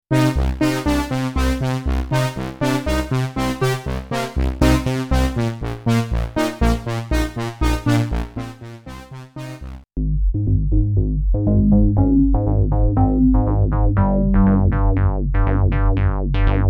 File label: analog pack